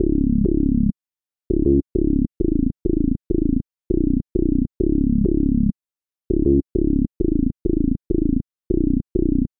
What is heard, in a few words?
bass; detroit